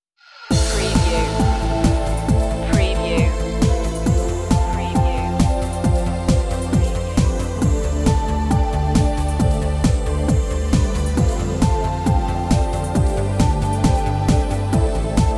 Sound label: moving on instrumental